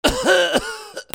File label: PEOPLE